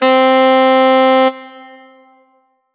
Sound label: Kurzweil K2000 Tenor Sax C4